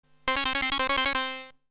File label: Harpsichord